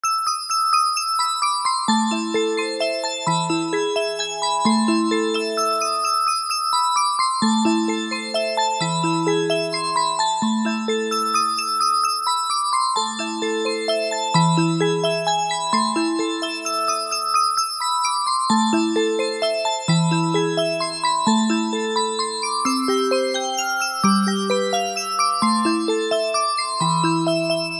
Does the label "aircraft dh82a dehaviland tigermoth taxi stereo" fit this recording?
No